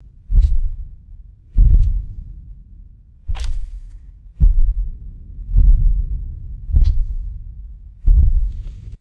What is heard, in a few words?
heavy boomy wet designed impacts; liquid